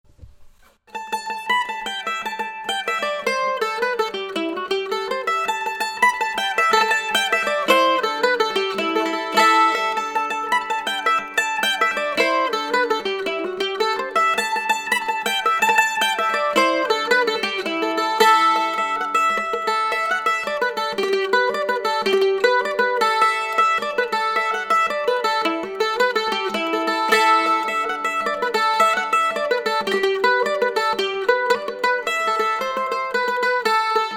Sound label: mandolin